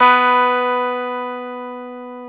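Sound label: guitar electric 12 string; Club-50 - Foundations Roland; E.12 String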